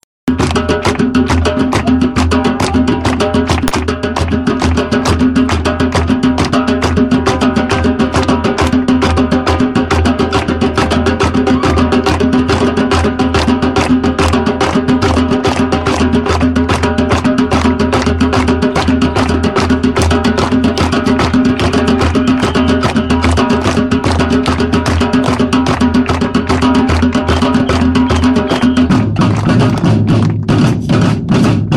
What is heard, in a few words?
with clapping